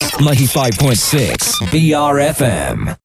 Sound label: Beep; BRFM